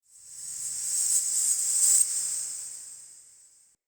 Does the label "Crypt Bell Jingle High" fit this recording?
Yes